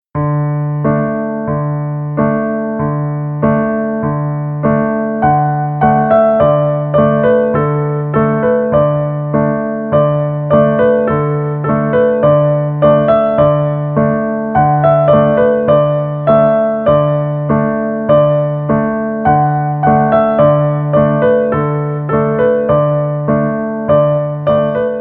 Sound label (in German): Chinesisches Lied, Viola; Fiedel-Max Vorschule Viola